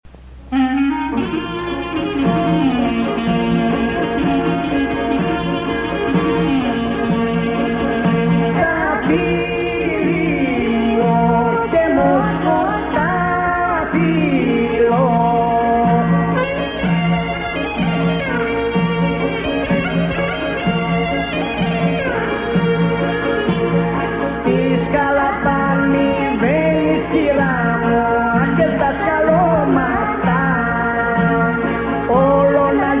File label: epirus vocal group